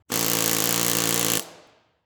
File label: Industry Tool Air Hammer Run Medium